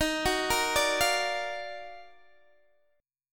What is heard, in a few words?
D sharp Minor Major 9th, Guitar, DADGAD